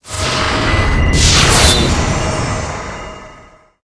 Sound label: trap boss attack